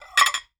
Plates Removing; Kitchen

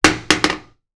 Toilet Seat Fall; Bathroom

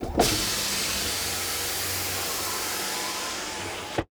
Power Window Down; Automobiles

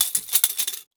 Tape Measure; Tools; 1001 Sound Effects

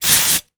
Aerosol Can; Bathroom

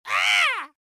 Crow Caw Synthetic; Animals

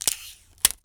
Masking Tape; Office Supplies; 1001 Sound Effects

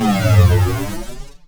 Radio Hit Descent; Transitional Elements; 1001 Sound Effects

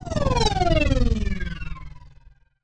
Space Ship Fly By; Sci Fi; 1001 Sound Effects

